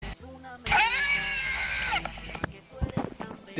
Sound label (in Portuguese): grito